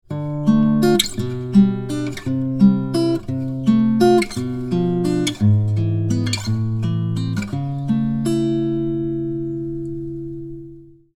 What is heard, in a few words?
Spread triad, guitar; Spread triads example 1